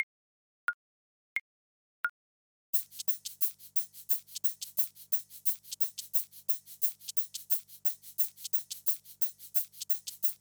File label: Cotton Eyed Joe, Cello